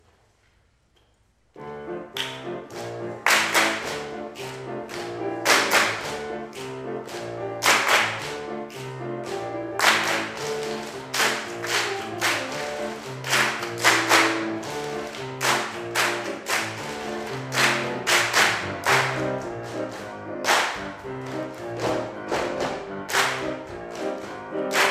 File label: Body Percussion, Jugendkammerchor; Jugendkammerchor Ahrensburg; England; Woodbridge School